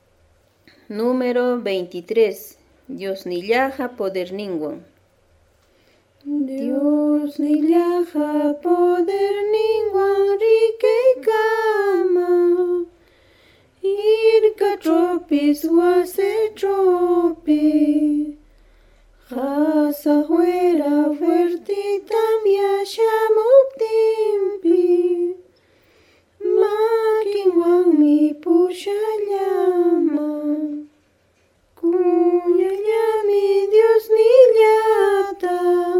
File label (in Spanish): cancion